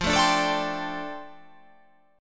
F 6th Add 9th F down, chord

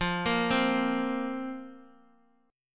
F Suspended 4th, down; arpeggiated; JGuitar

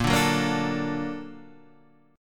Bb Augmented Major 7th Bb, Guitar Standard; Bb+M7 strummed; JGuitar

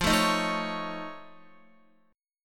F Augmented 7th F Guitar, chord; strummed

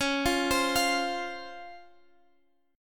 C sharp Major 7th C sharp; 18,17,20,20

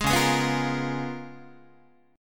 Db 13th Guitar, Db13 chord; strummed; JGuitar

